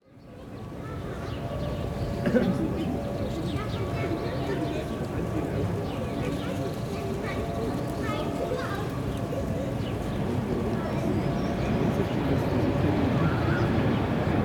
PLAYGROUND, MID CLOSE TRAFFIC, AMBULANCE SIREN, KIDS AND THEIR PARENT, BERLIN; GRIMMSTRASSE; KIDS AND THEIR PARENTS; creativeaudiopool